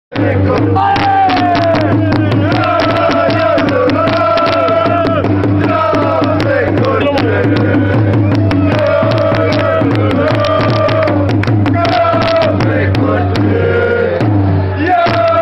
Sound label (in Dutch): lalalalalala fanchants; kv kortrijk